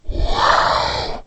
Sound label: Dragon Roar Short